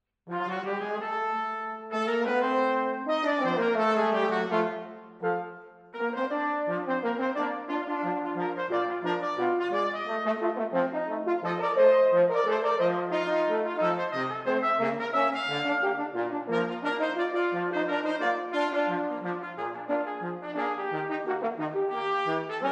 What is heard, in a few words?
Ragtime, brass; LadyBrass